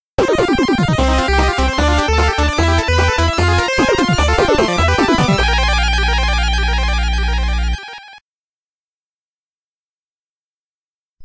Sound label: BGM BOSS Defeat 8bit; Mighty No. 9; 8-bit soundtrack